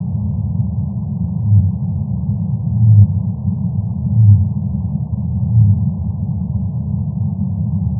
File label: Brydes filtered amped